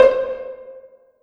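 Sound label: Roland JV-2080 Pizzicato Strings C5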